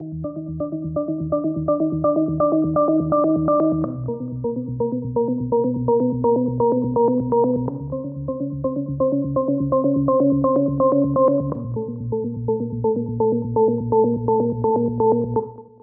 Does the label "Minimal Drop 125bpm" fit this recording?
Yes